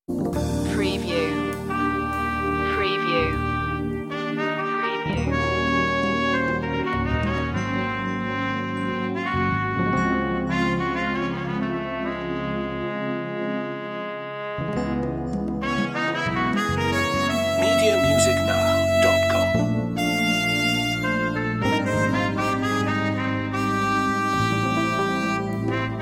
fusion break

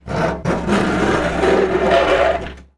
Metal Stress - Scraping Metal Rock

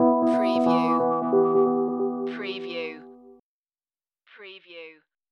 Hip Hop Dark Rhodes Licks 90 BPM Swing - E

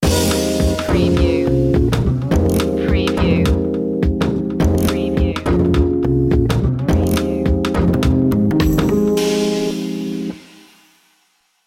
flute fantasy sting

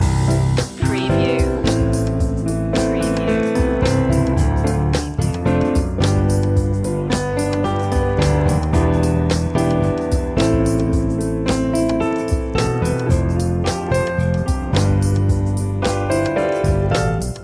jazzy loop; media music now

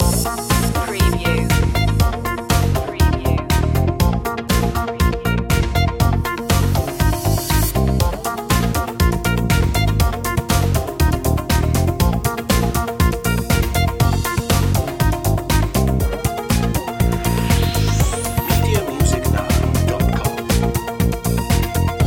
electronic future loop long; media music now

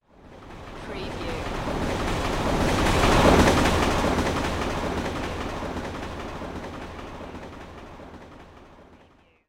Subway Pass By

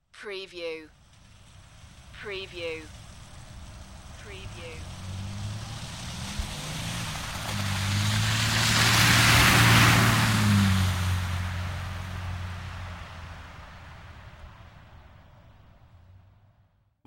Auto Pass By Snow; sound effects bible

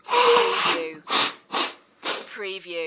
Nose Blow

